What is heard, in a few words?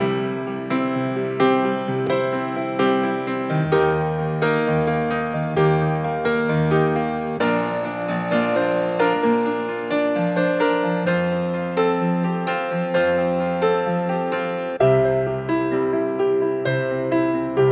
Acoustic Piano Solo Pop Piano F 65 bpm